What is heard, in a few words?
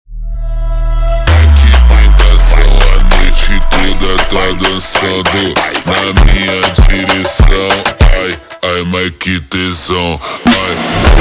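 slowed, phonk music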